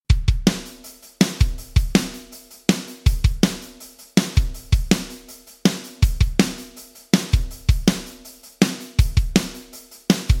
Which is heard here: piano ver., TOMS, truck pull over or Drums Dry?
Drums Dry